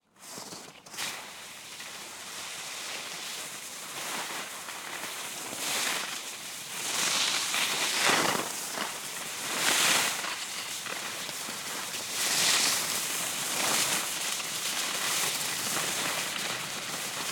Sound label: Skiing ski downhill turning carving snow ice crunch stop; Matt Script